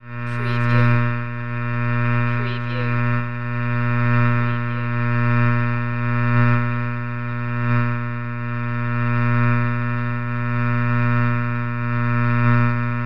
Electric High Buzz Pulse

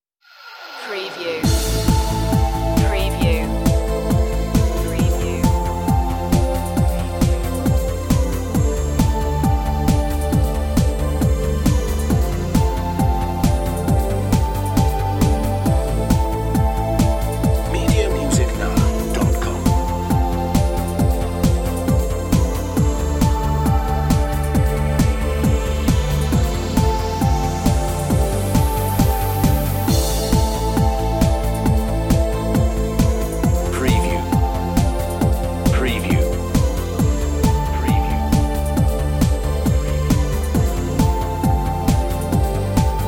moving on instrumental

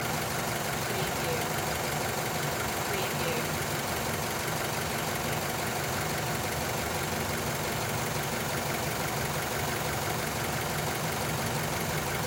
Car Mazda 3 Idle Loop

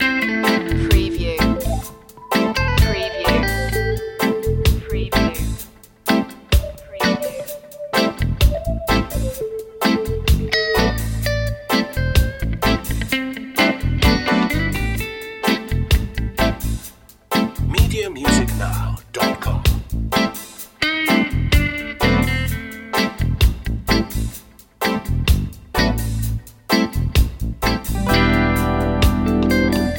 reggae love loop

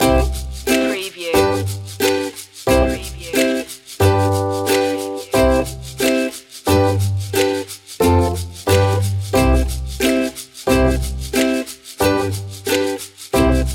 plucky life loop

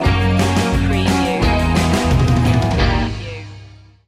storm surfin stinger

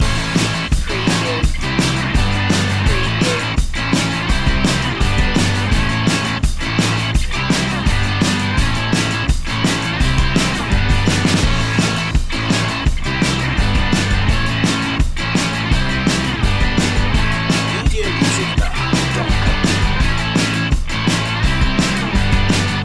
indie party loop

